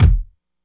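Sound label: kick, Breakdance 172 B minor; Big Fish Audio; Hardcore Breakbeat Action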